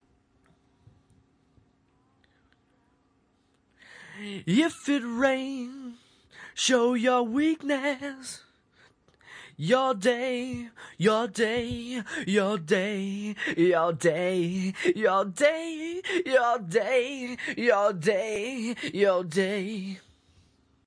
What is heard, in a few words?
male vocal, 180 bpm male acapella; vocal acapellas